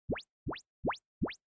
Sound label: foot